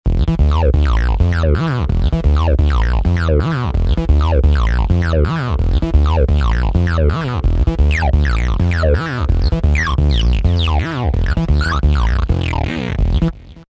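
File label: TB Saw Distorted Bass, Roland V-Synth; Factory Patches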